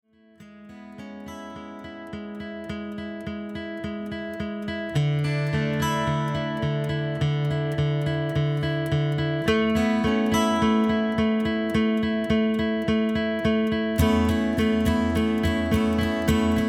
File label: pad